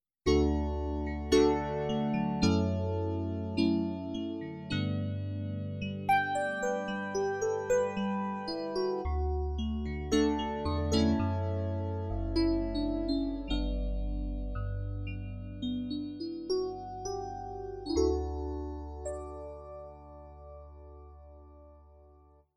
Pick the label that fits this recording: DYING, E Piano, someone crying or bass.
E Piano